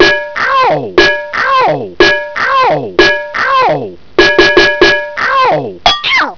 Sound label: Frypan